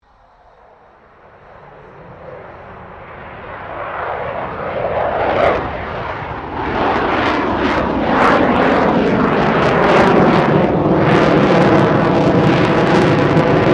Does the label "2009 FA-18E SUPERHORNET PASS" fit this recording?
Yes